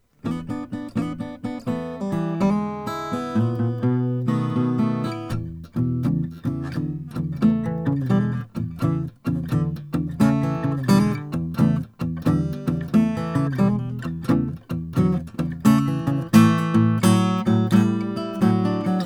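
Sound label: Santa Cruz acoustic guitar; 1990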